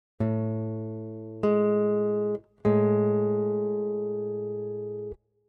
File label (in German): Intervalle Grosse Septime